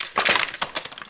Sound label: Gun Weapon Pickup; SFX Kit; Pickups